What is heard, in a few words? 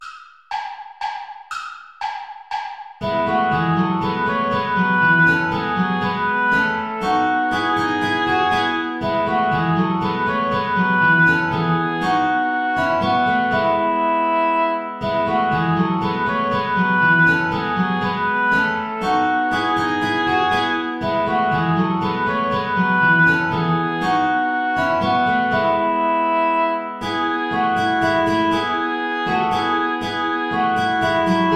saltarello play along without guitar